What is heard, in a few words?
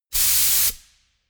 pneumatic pump long